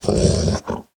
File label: wolf growl; wesnoth 1.12.2